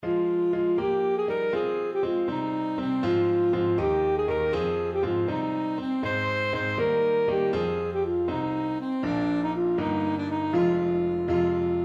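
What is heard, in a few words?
jenny plucks alto saxophone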